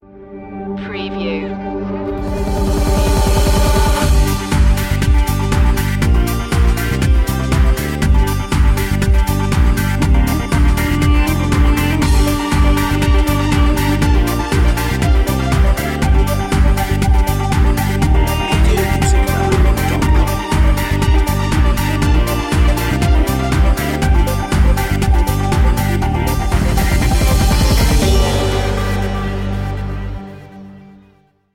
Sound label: oriental fantasy